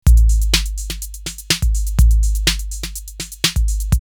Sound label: 808 Deep Cool 124, drumloops, DrumMachines; X-Static Goldmine